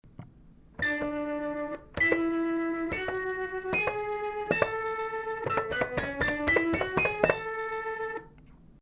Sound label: Keyboard